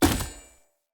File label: Short Sword Throw Medium Impact Wood Hollow Large; Weapon Impacts; Player; Swords; Environment Throw